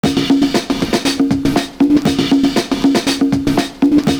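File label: ETHNO 70, 119 bpm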